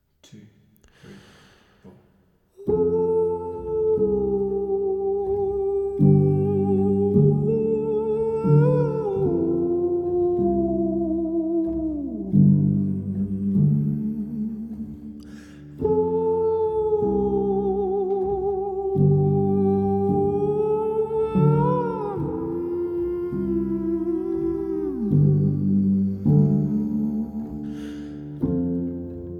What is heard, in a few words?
Piano